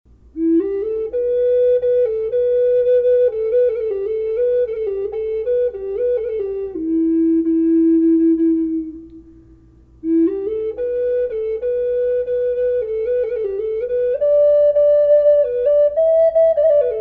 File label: golden eagle reverb e minor, flute; collector flutes